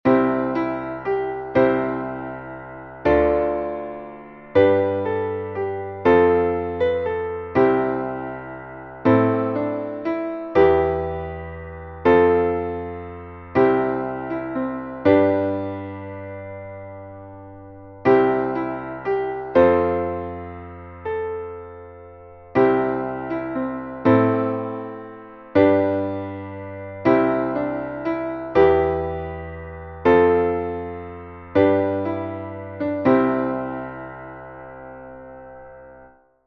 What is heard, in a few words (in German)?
klavier; child in the manger